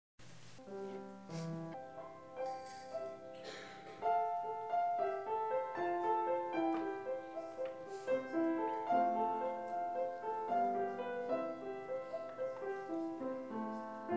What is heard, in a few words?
trumpet; The Lords Prayer